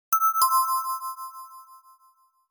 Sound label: doorbell; qs-housing; WestsideRP